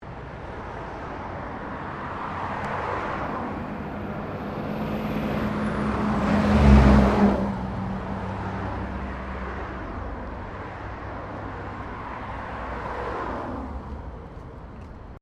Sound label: Traffic Truck Pass By; General traffic sounds; Traffic and Cars (Sound Effects:Backgrounds)